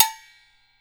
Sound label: RECO BELL, Brazil Drum Perc; Drums&Percussion; E-MU Producer Series Vol. 7 – Old World Instruments